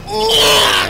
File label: nyeegh